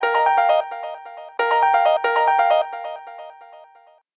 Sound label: Piano Bell (broken); Motorola Rival-A455